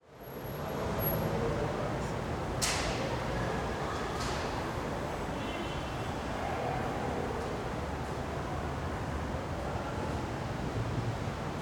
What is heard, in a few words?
Parking Garage, South Side; Glendale Galleria; RDGSFX004 - LA Parking Garages